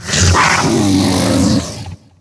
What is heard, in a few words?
medusa hit; DAoC